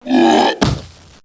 Familiar Death Sound; Heroes3

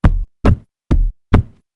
Mammoth Move Sound; HotA

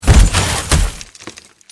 Cannon Death Sound; War Machine

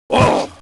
Battle Dwarf Attack Sound; Heroes3

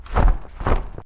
Couatl Move Sound

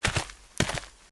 move sound; Heroes3; Bounty Hunter

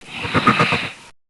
Storm Elemental - Death Sound; Heroes3

